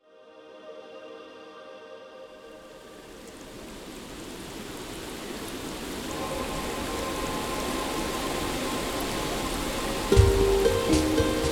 Oriental Wellness Spa

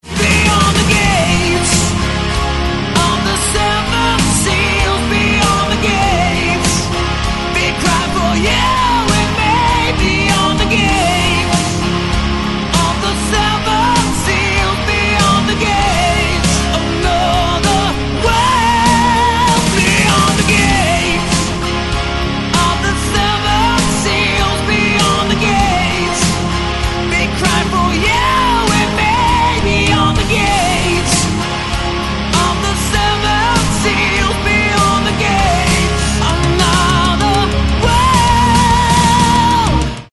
Ringtone metal

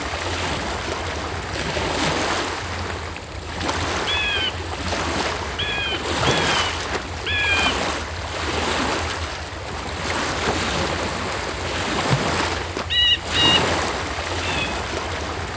Seagulls on the Beach; Binaural Beats

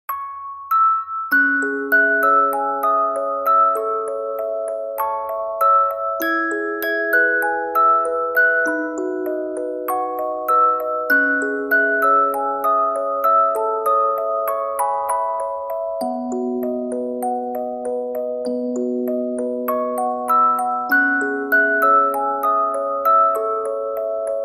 Music Box

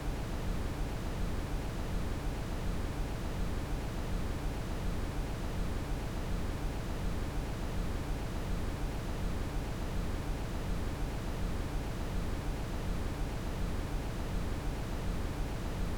Brown Noise

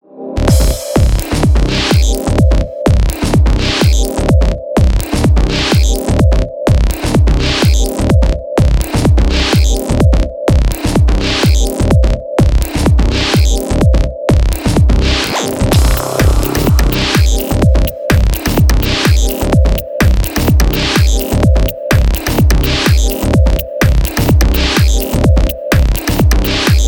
Static Noise

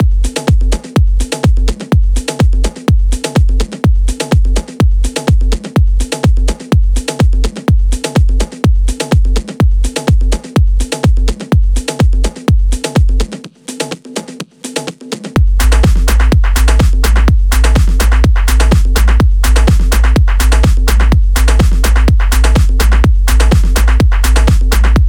Cha Cha Cha Club Beats

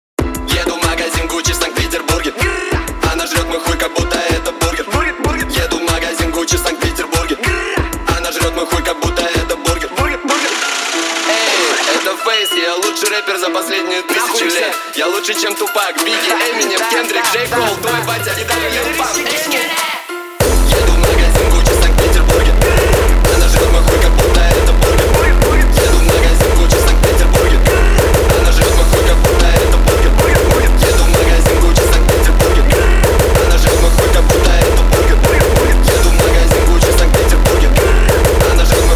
Hardstyle Remix

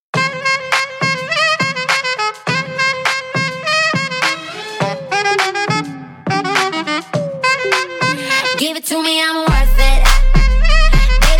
28-31Hz low bass

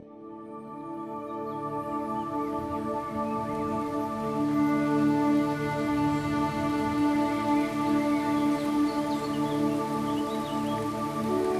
Zen Spa Rain

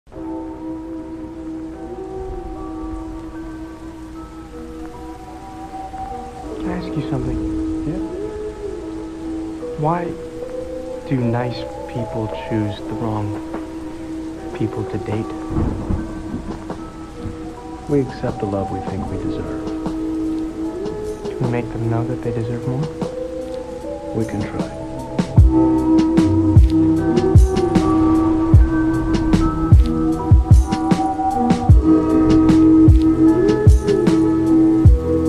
Lofi HipHop

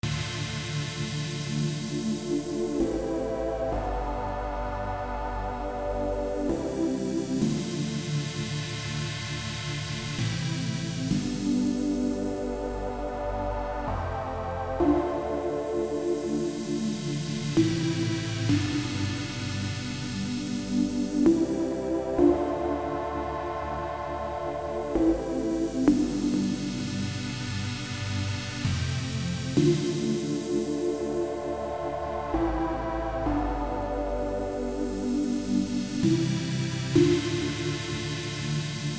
Postive Pads and Piano

